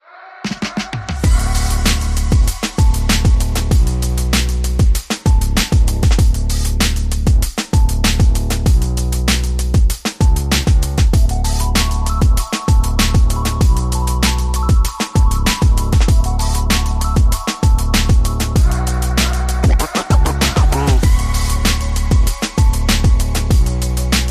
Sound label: No Piano Reduced Arrangement